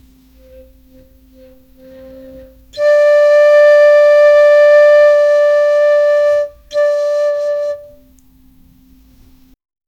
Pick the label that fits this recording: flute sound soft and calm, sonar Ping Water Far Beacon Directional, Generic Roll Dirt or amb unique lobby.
flute sound soft and calm